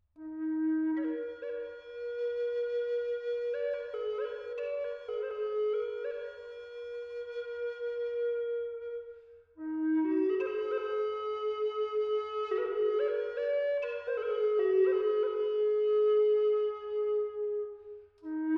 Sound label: Eastern Red Cedar E 432 Hz